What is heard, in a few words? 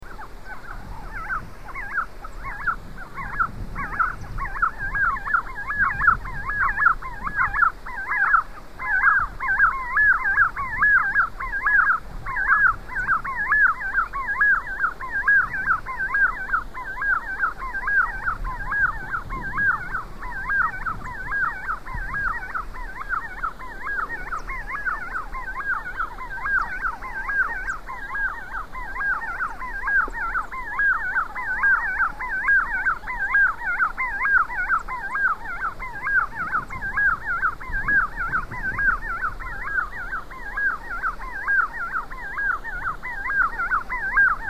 Puna Tinamou; S. America